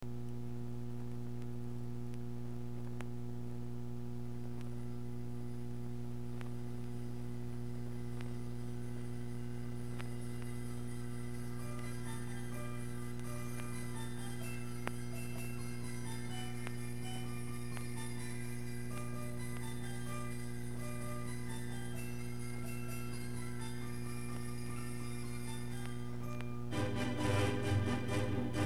Christmas Pipes and Chimes Jingle Bells, theater organ